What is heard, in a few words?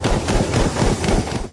ship guns; attack sounds; Unciv